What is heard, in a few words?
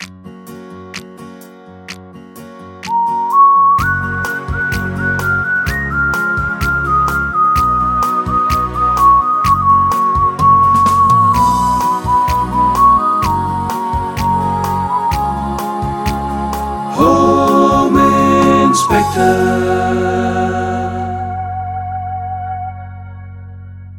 Jingle; The HomeInspeKtor Jingle